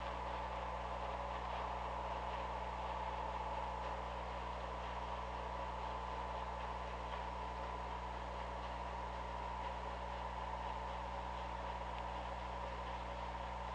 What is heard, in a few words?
Orca; OrcaLab